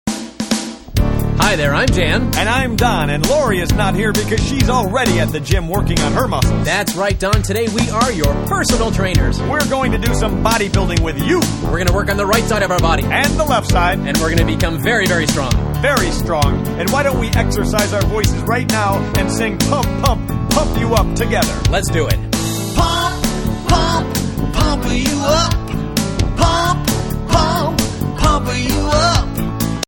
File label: pump, pump shuffle